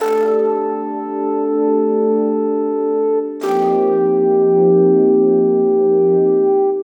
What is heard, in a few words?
Pad Soul Chords, Drum N Bass; Keys; Sonic Foundry (Sony Creative Software); Knutrix Drum & Bass